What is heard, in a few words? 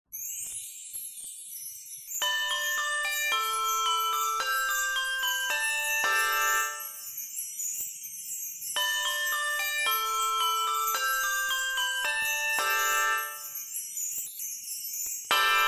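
handbell playback; Jesus Christ Is Risen Today Alleluia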